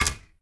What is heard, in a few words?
click